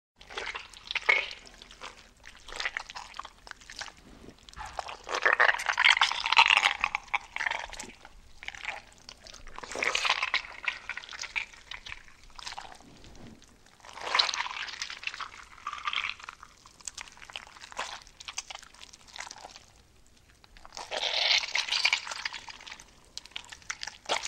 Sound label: Slime Squishes